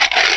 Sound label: new pick armor; garrysmod